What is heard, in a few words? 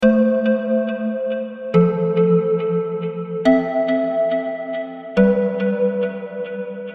pad fx